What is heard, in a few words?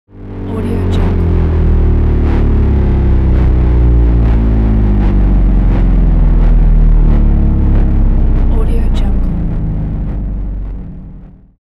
background noise distortion transformation